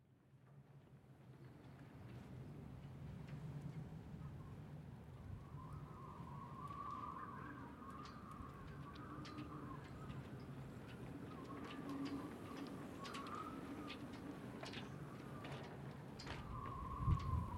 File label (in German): Bootsliegeplatz, Rostock